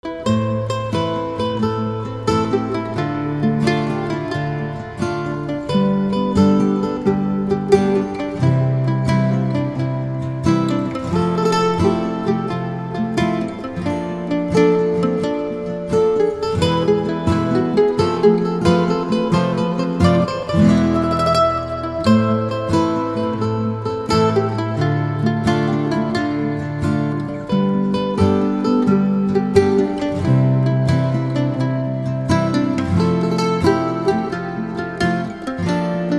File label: Mandolin